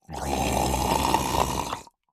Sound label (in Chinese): 溺尸待机声